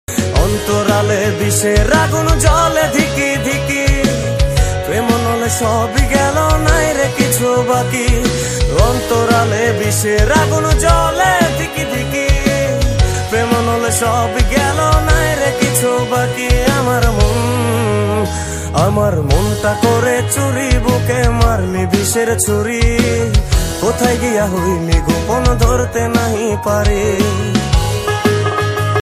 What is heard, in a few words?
beser sori bangla